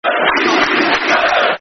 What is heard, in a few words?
East, East, East London, Football Chant; West Ham WHFC